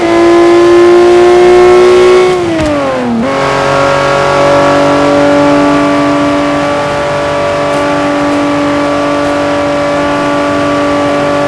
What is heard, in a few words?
fourth cruise, vehicles; gmod; tdmcars; reventon